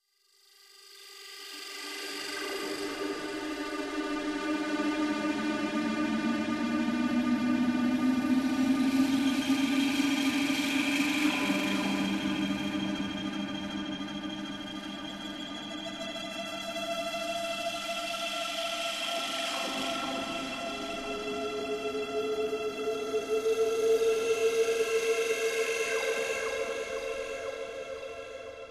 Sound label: Ambient Cue; Warframe; Tenno Hubs Cephalon